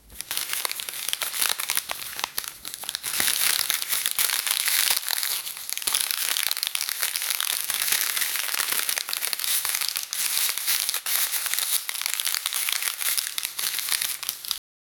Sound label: crumpled paper sound; everyday sounds